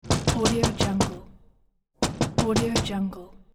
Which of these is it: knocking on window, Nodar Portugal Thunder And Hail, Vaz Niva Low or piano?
knocking on window